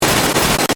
Static Burst Stutter, Static, Burst, Stutter; Production Element, Imaging Element; Accent, Transition; Static Sound Effects; BlastWave

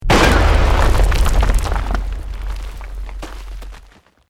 EXPLOSION MEDIUM STONE ROCK

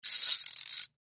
Industry Ratchet Turn Loosen, hand tool; Hand Tool Sound Effects; Hand Tools (Sound Effects:Tools); SFX Bible 6

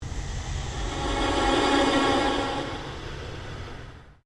PULSE LONG SWEEP; Sound effects created by manipulating other sounds; Sweepers; Sound Design

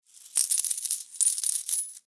Foley Coins Count In Hand Movement; Money (Sound Effects:Objects)

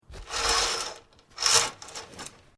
Curtain Window Small Close, Household Curtains; Living Room

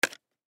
Hockey Helmet Hit, Hockey Helmet Hit Smack Single; SoundEffects-Blastwave; Sports

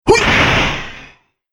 Karate Impact Smash, punch and hit

